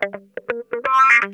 TIMER, Funk Guitar; Zero G - Funk Guitar

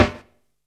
dope, snares; Zero-G; Funky Elements

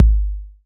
BD808, TR-808; 90 s Sample CDs; Sound & Vision - Gigapack I CD 1 (Roland); TR-808 & 909

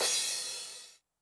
crash cymbal Med, 909; classic machines; 909mod; CC - CRASH CYMBAL

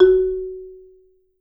MSOFT F#3, Marimba

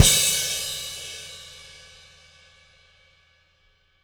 CW KIK -L, Living Drums, SD CYMBALS; Sampleheads; Peter Erskine

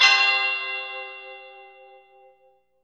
bell fm (C); Sonic Foundry (Sony Creative Software); Ugly Remnants VOL-2

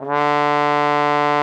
D3, TROMBONE; Xsample VOL-3

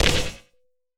noise percussion; AKAI S6000 CD-ROM - Volume 5

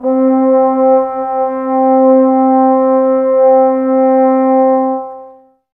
Brass, Woodwind Brass Ensemble

